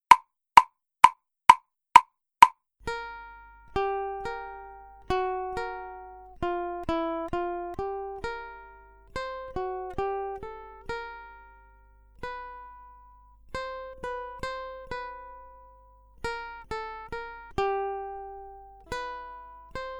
Accompaniment, ukulele; grade8

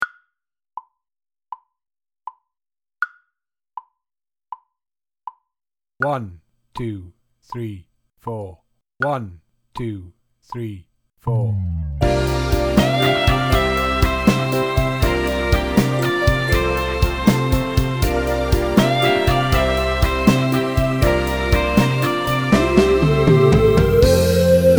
Gospel Full mix, drum kit

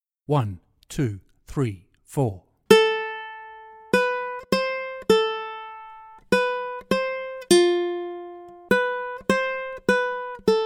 Accompaniment, ukulele

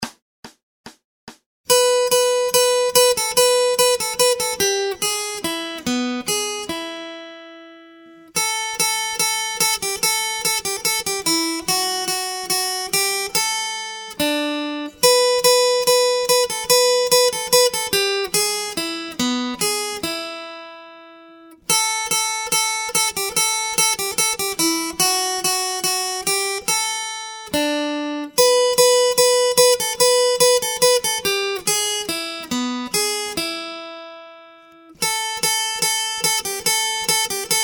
Acoustic Accompaniment, acoustic guitar

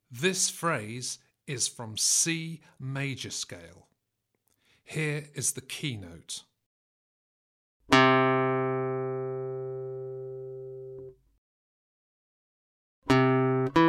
Aural Pitch Test, acoustic guitar; Grade 3

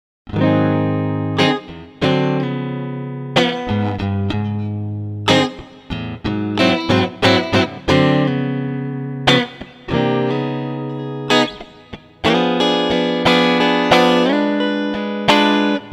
Improvised Rhythm Guitar Playing Example; electric guitar; electric guitar performance diploma

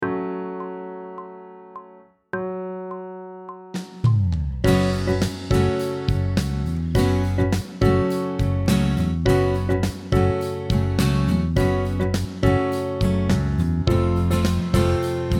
Sight Singing backing track, pop vocals; Grade 8

